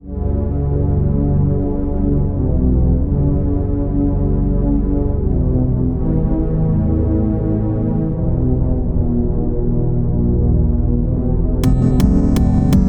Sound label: Instrumental; Bambo